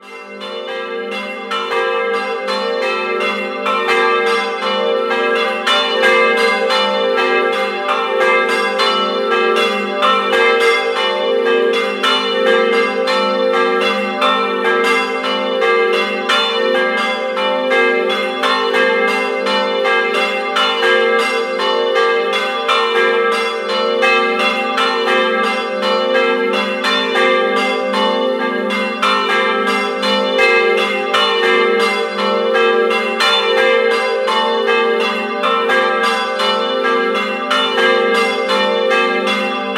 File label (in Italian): CARGNACCO, campane